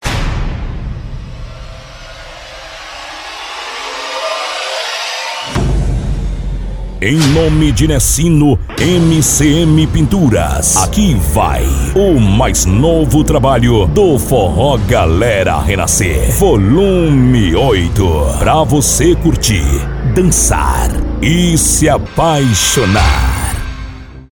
Forro abertura

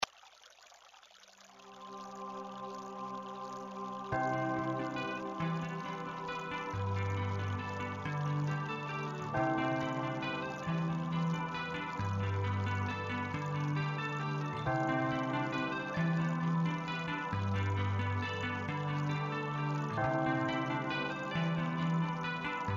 instrumental

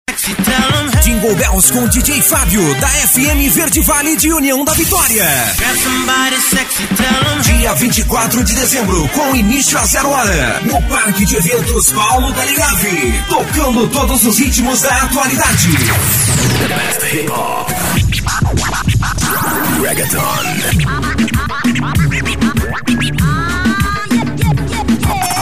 spot jingle bells

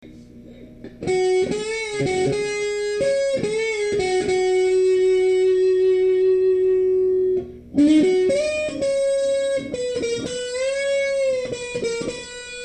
solo

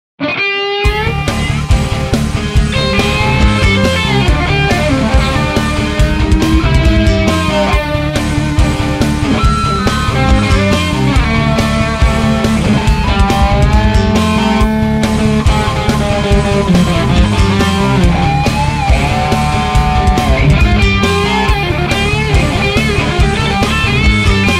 solo

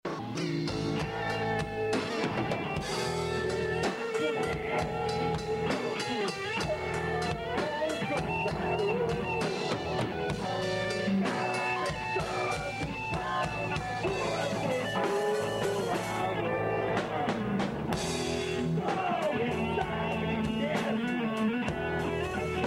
improvisation blues song

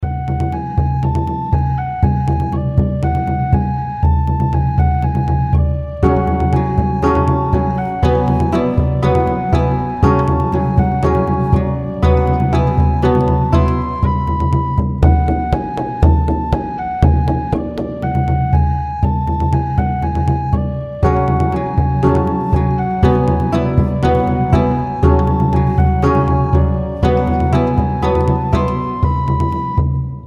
prelude dance instrumental

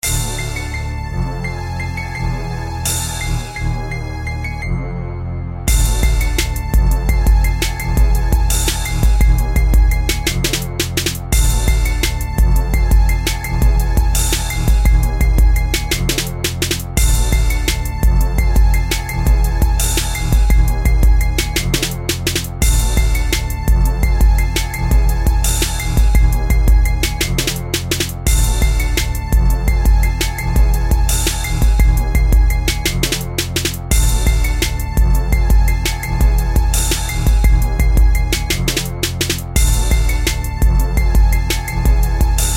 instrumental rap

